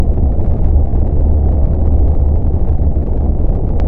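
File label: Gentle White Noise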